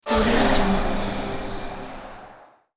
magic huge disappear shimmer; game sounds; ناپدید شدن جادویی

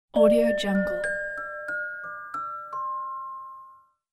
welcome ding; interface sounds

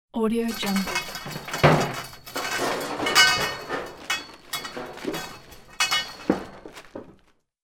attic junk rumour; domestic sounds

